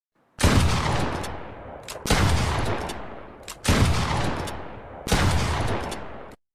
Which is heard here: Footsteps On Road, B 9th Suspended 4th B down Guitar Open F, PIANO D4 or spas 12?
spas 12